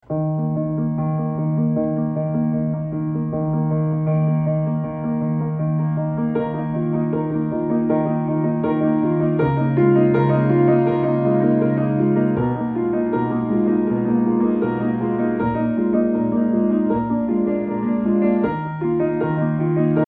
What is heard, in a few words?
Piano Piano; Scirocco